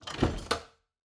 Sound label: ambience room secret room chest